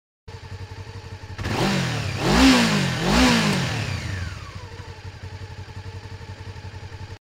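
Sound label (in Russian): peregazovka motocykla, motorcycle revving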